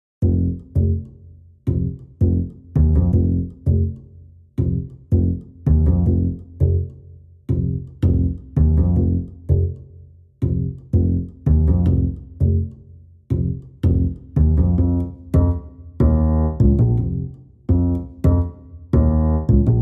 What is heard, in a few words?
Piano and Bass Only